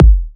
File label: Entourage Kick; 128BPM; Vengeance Samples; Entourage Kit